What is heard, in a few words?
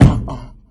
Kick